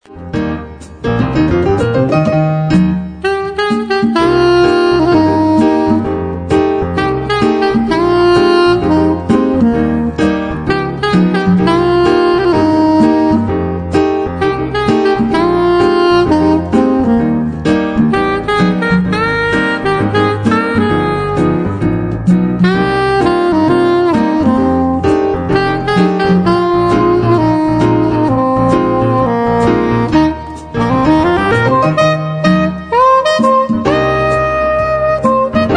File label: sax